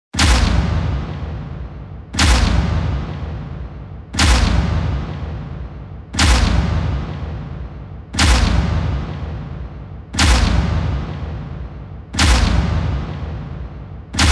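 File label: like strikes of a metal whip; Звуки обратного отсчета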